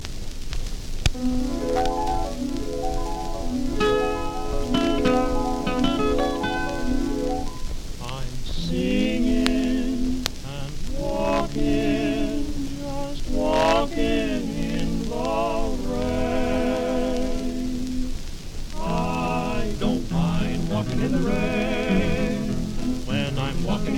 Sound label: vocal trio with piano and guitar